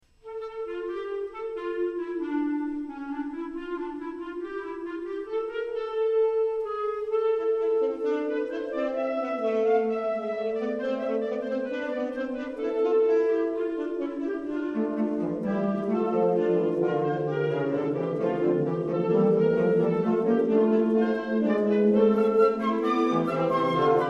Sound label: Fugue